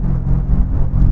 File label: metal eng; Quantum Redshift; XBOX; kukai